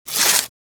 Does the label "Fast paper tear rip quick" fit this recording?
Yes